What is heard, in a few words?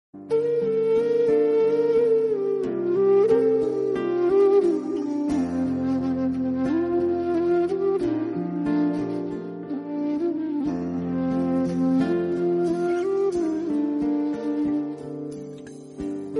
Flute Bgm; Tamil